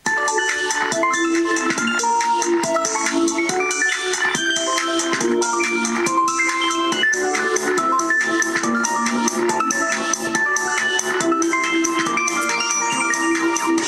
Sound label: high tide ringtone; samsung galaxy s3